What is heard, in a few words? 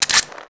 Pickup Ammo; level items; Max Payne